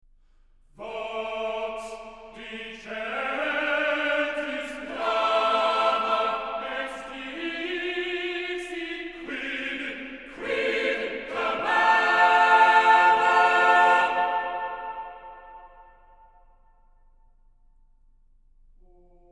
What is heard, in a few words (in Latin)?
vox dicentis clama